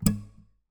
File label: Classical Guitar Damped Pluck; Classical Guitar Extended Technique Stereo